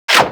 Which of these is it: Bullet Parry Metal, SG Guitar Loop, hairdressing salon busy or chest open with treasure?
Bullet Parry Metal